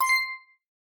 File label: Sys Set pop up